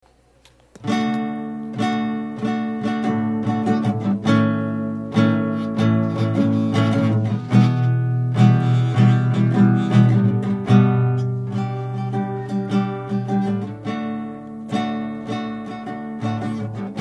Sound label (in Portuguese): Jingle